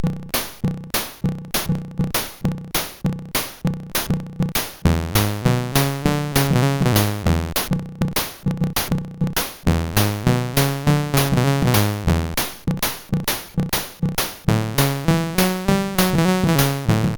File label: CSG8580R5, GAMES; Street Rod